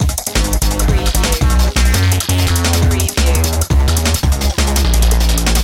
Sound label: drum n bass loop